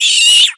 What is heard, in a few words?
rat die; critters